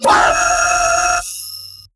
Sound FX Darck Forest

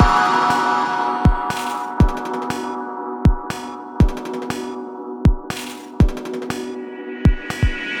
future, pitchglitch loop

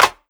Clap Snappy; pitchglitch

